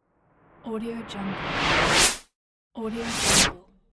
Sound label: magic wand reversed whoosh